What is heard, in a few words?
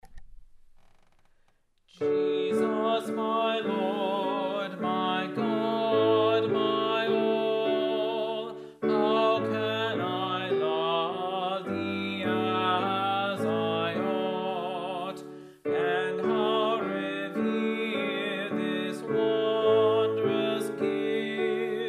Tenor; Jesus, My Lord, My God, My All